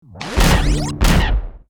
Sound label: Armed Ghost attack